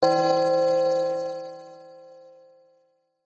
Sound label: church bell; meme sound effect